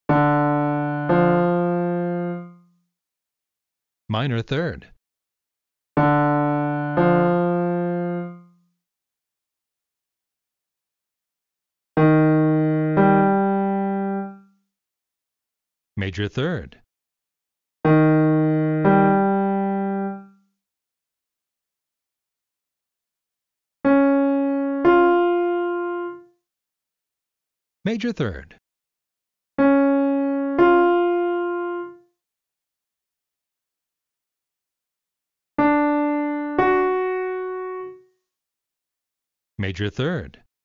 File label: Interval ear training exercise track example